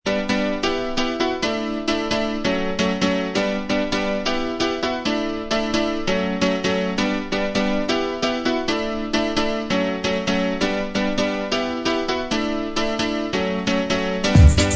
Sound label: Instrumental